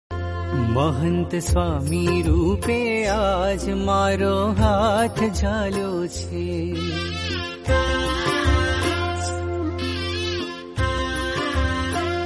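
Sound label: kirtan bhajan, gujarati; baps; mahant swami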